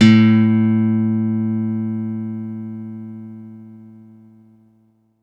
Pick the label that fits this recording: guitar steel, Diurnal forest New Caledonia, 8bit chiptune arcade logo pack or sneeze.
guitar steel